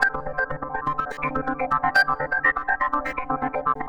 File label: Analog Synth Pattern; Sonic Foundry (Sony Creative Software); Electronic Point Blank